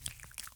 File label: lava; Mob